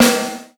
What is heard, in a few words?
GATE SD, Drums Acoustic; USB Soundscan vol.10; AKAI; 90s sample CD; GATEKIT 2